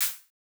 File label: Hats, One Shots; Touch Loops; CosmicFunk